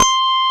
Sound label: Korg CX3, C 1